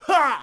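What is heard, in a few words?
Archer Attack; Sid Meier's Civilization 4 Complete; Warlords; Units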